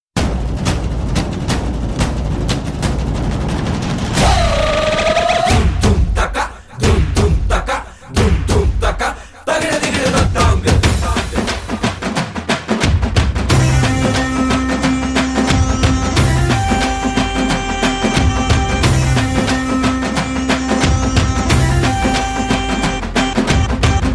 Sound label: Drum Beats